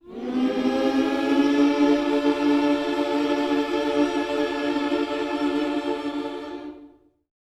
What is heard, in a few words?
MM MINOR, Vocal Gospel; Spectrasonics; Vocal Planet Vol.1 Gospel